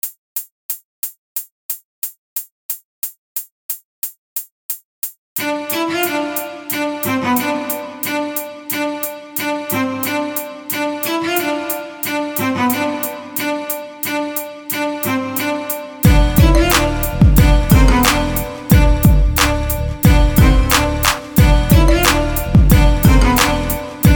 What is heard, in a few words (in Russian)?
скрипка (instrumental)